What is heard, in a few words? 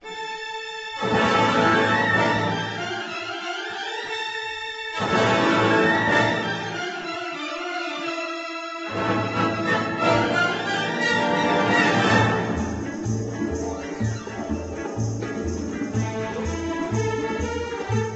Arabian